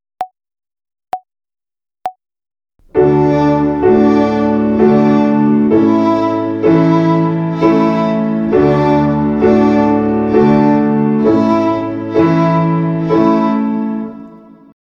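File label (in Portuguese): Ginástica para o dedo 1 - Corda RÉ - Ternária, Violino; Violino para os Pequeninos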